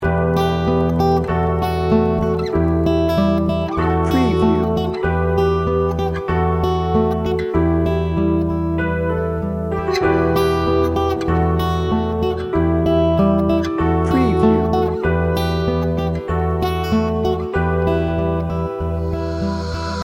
dreamy reflections loop

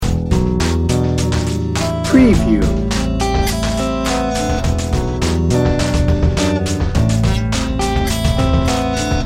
floating dreams loop

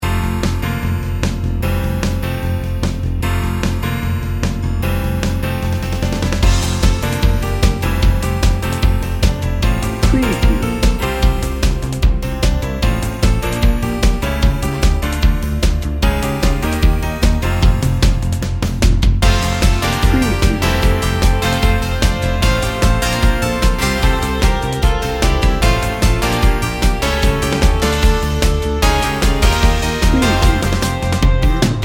upbeat inspiration 60 second

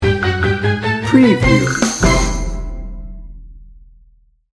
50s game show sting bumper

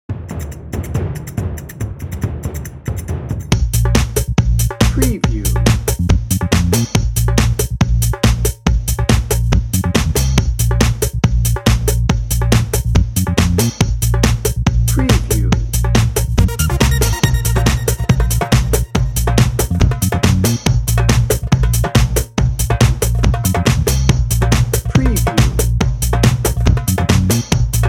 bollywood bash bed

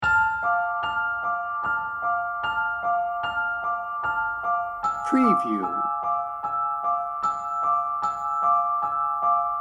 dark forces loop; Dark and mysterious, featuring pulsing textures, eerie strings and a groove that creates a

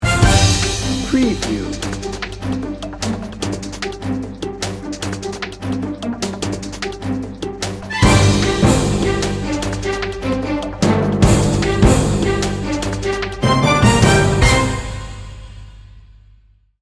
anchor news

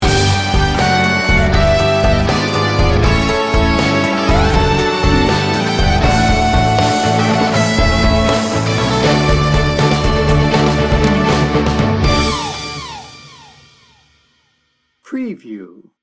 o come all ye faithful sting bumper

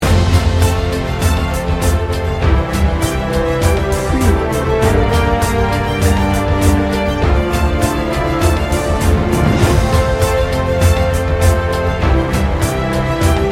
adventure quest loop

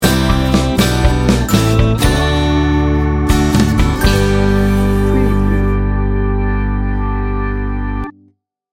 shine a light sting bumper

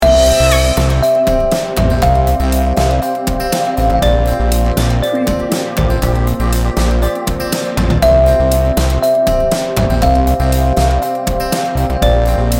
atmospheric and dreamy loop

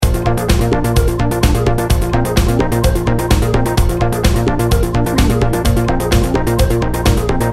driving ambition loop

